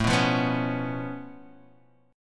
Db Augmented 7th A

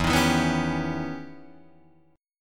E Minor Major 13th E Guitar DADGAD; EmM13; strummed

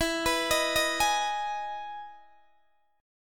E Major 7th E down Guitar DADGAD

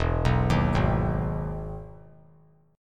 E Major 7th E down; EM7 arpeggiated; JGuitar

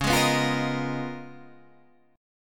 C sharp 13th C sharp, Guitar, Standard; strummed; C#13; JGuitar

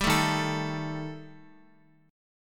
Eb Major Flat 5th Eb Guitar; EbMb5 strummed; x,x,5,2,3,4; JGuitar

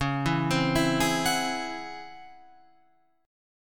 Db Minor Major 11th Db down Guitar Open F; arpeggiated

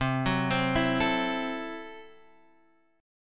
C 6th Flat 5th, C, down, Guitar, Half Step Down

